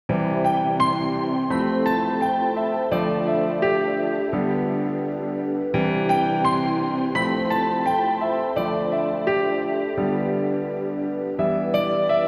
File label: Heartwarming; Alice Gear Aegis